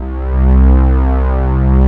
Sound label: LEAD; Pro-Rec - Synthsonic